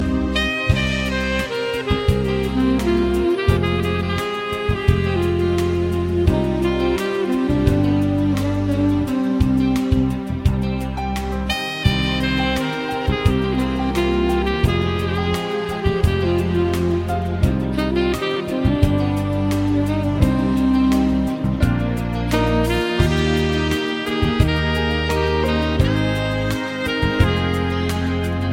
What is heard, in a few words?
Sax